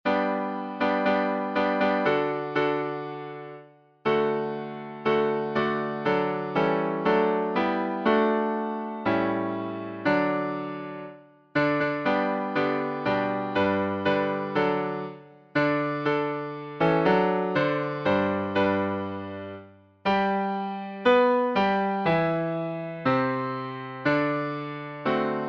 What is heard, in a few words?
Hymns